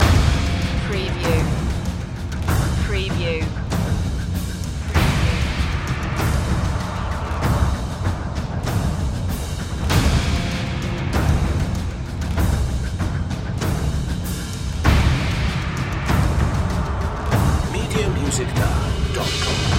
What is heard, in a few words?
percussion loop